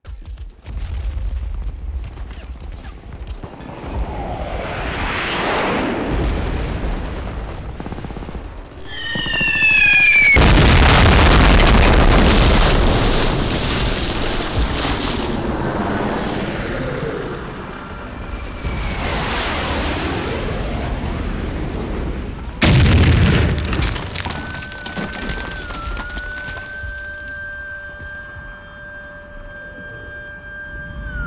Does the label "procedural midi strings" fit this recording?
No